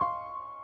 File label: piano high